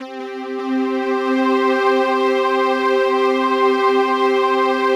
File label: PAD, ATMO PADS; Best Service; XX Large